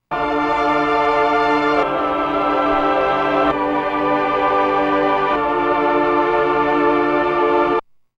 S750 Mellotron Choir Strings

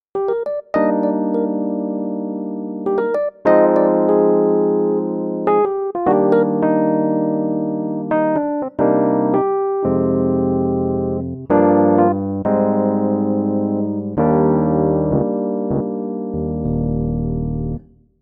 kawai cs10 classic epiano

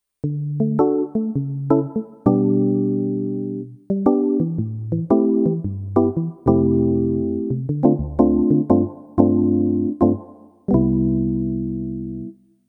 Roland E-X10 50s E-Piano

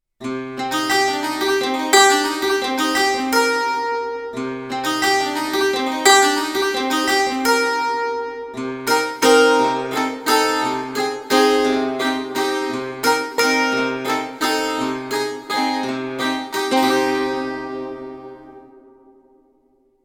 Dulcimer Hall 5 s

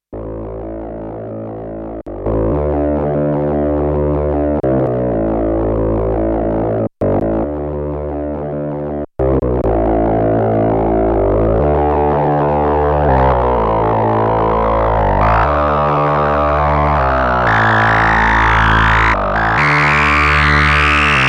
Vermona; Vermona 14

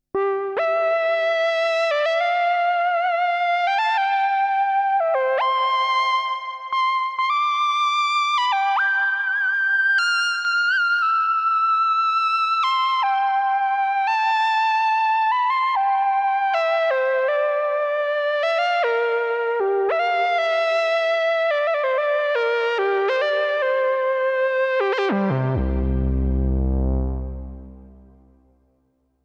Prophet-10 Lead Quantec QRS

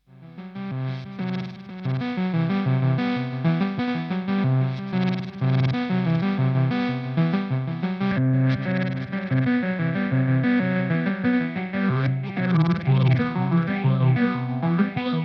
Dreadbox Lethargy

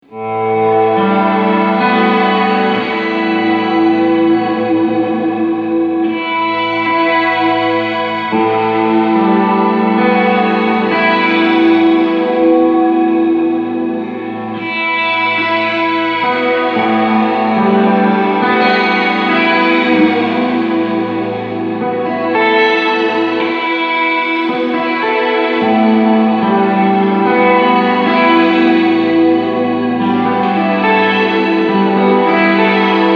Texture Amp

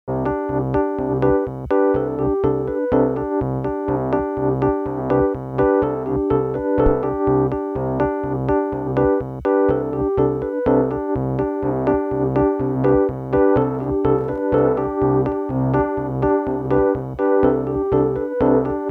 chorus epiano

